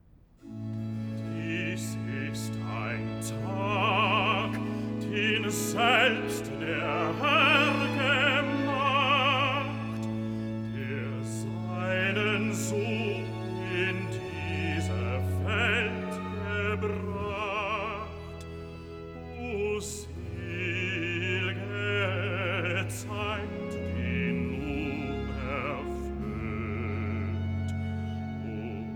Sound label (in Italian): Recitativo (Bass); Cantata, BWV 122 'Das neugeborne Kindelein'; V. Recitativo (Bass) 'Dies ist ein Tag'; Bach; New York; Sun after Xmas